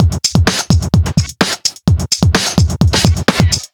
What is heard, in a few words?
House Beat, 128 BPM